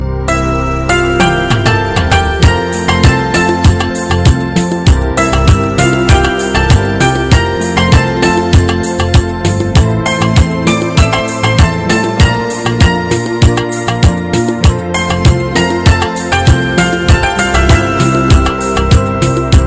Instu

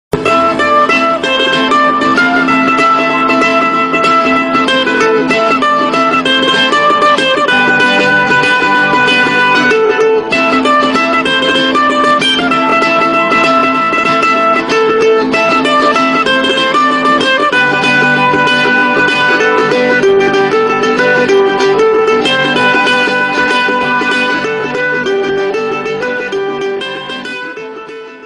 guitar ringtone

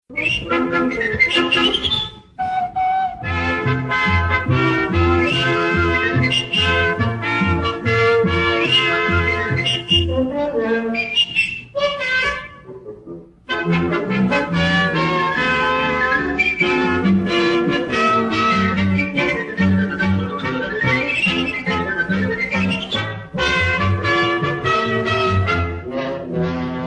Ringtone Theme Song Instrumental

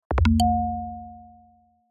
Samsung Tone

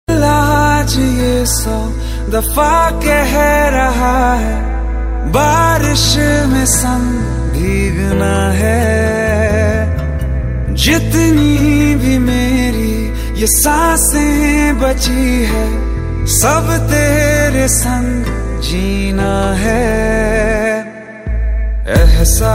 ringtone

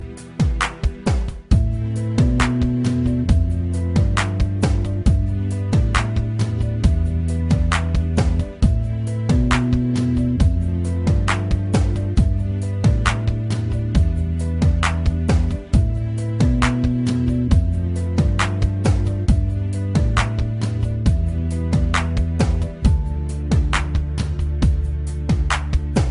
Reggae

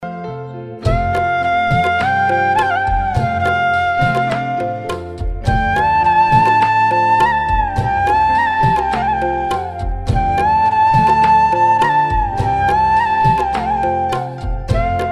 Basuri Bollywood

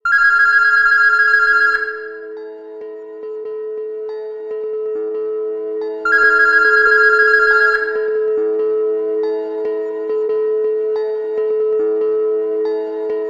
iphone 6

